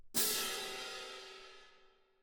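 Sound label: Cymbal, Jazz for piano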